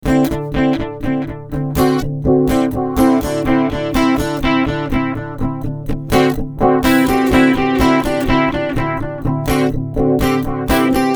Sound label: Filter Pad